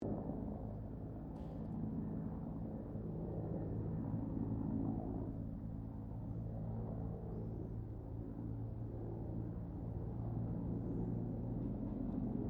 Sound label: HELICOPTER BELL 204 FIREFIGHTER MANOEUVRING DISTANT